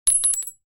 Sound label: cart